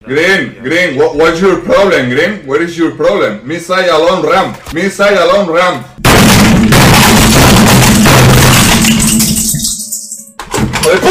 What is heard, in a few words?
tense alone ramp